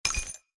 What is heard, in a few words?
Shop Buy Item